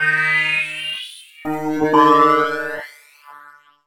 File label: Open Bloom, Synths; Sonic Foundry; Modular Electronica